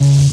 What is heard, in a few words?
fuzzy, Synths; Notes